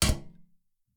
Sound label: arrow metal, attack impact